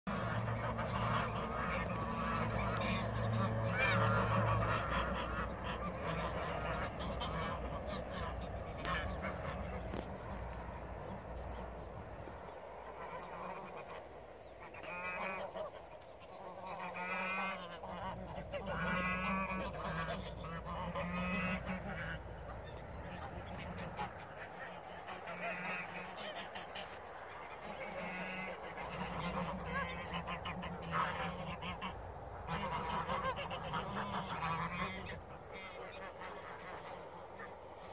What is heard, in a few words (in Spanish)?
Sonido Ocas